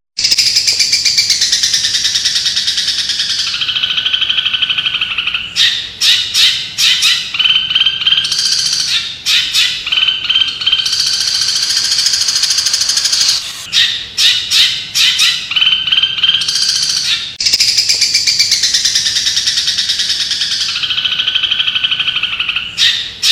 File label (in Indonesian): audio cendet isian burger